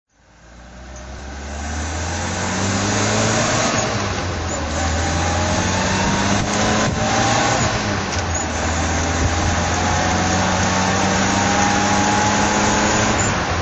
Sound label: morgan ford drive